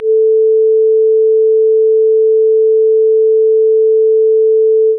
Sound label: a4 440 sine